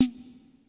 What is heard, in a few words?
tom shakr, Drums, Kurzweil K2000